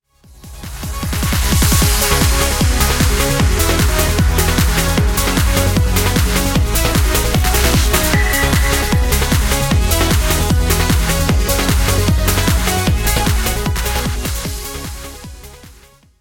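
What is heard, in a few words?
background music crossfade; Invaxion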